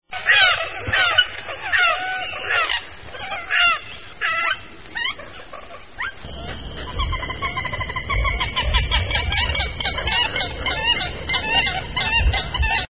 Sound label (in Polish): kurka wodna